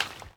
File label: step splash